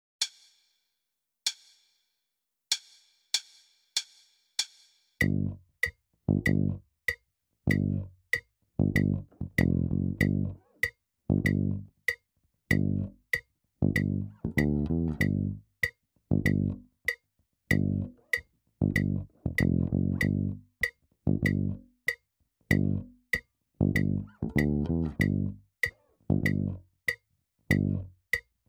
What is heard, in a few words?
Rock Funk Rock In 1/8